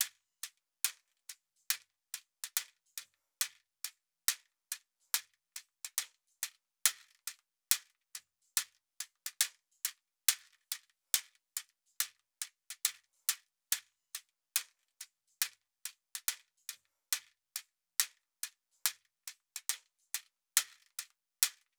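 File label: shakers B 070, Hip Hop India, 070bpm; Hip Hop India Modern Percussion; Apple Loops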